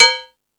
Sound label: Percussion, Drum Machines